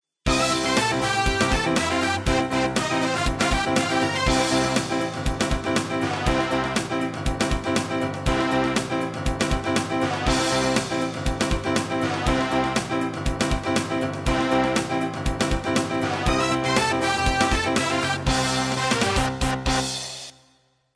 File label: music twinkle jingle